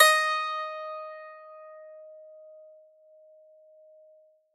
ds4 Pick, strings

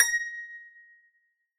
b5 Pick, strings

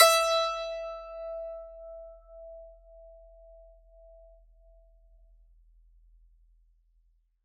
e4 Pick, strings

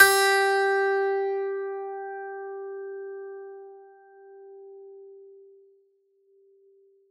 fs3 Pick, strings

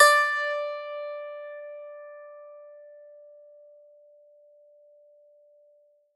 d4 Pick, strings

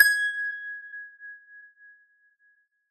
gs5 Pick, strings

